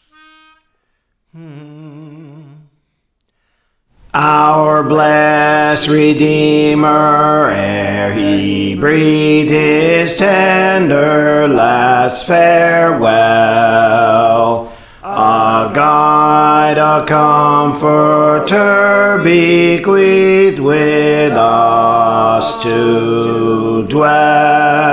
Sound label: Bass; Our Blest Redeemer ere He Breathed; Praise For The Lord Training